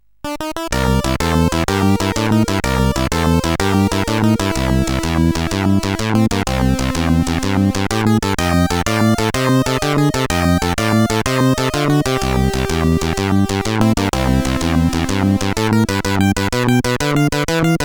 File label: Little Boogie, CSG8580R5